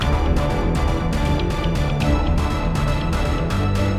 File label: Gameplay Run Faster Loop; Corridor Z